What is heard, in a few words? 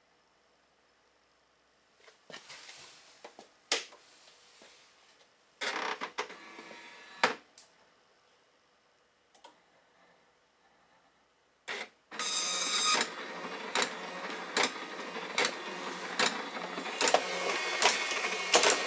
Dot matrix printer in action